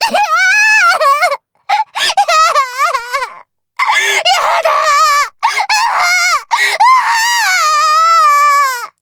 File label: crybaby